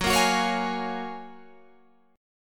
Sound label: F sharp Major 9th F sharp, Guitar Open G